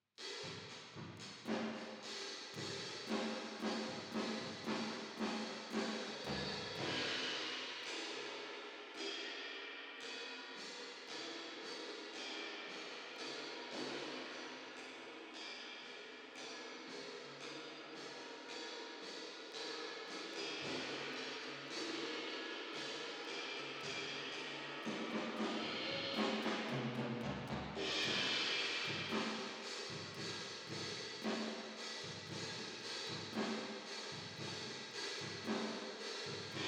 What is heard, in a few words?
Drums Verb